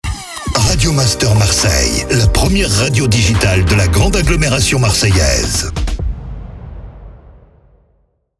Jingle MEDIUM; Radio Master MARSEILLE

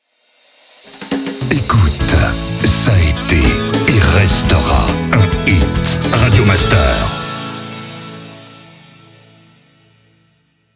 Jingles SLOW; Ca été et restera un hit

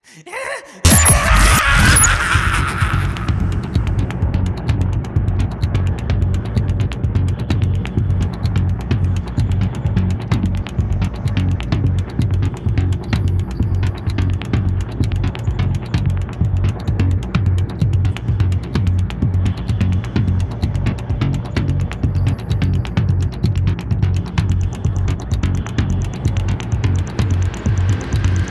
Beat 128bpm KEY f#; DR The Ghouls Beat; Contest Kit Donut

